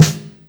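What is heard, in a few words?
Snare Ghost, Yamaha DTXpress Acoustic Snare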